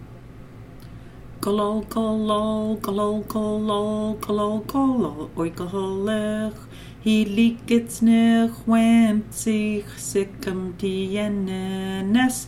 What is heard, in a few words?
jingle bells